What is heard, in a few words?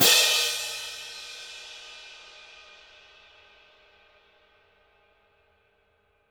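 cymbal, Orchestral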